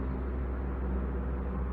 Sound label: plane